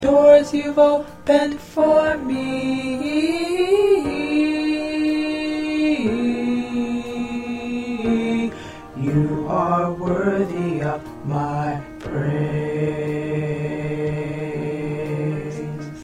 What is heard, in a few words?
Tenor